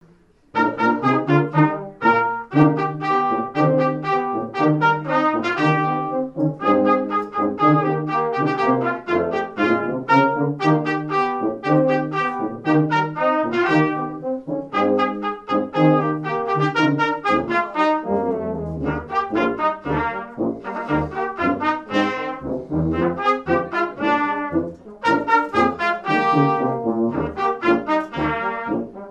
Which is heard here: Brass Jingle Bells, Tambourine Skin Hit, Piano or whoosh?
Brass Jingle Bells